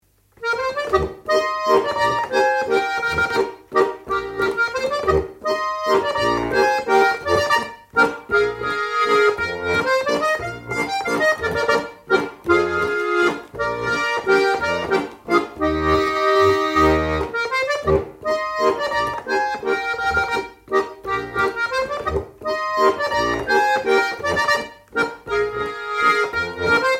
Walzer; Quarona

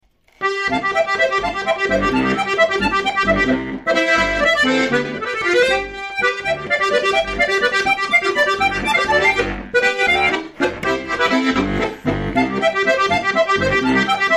Lander, Volksmusik, Akkordeon; Ziachorgl

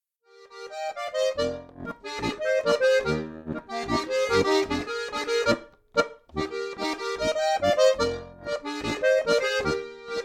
Boarischer; inkl. CD in B-Es-As-Des und G-C-F-B; Griffschrift

